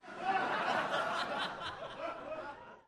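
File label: Crowd 100 Short Light Burst; medium int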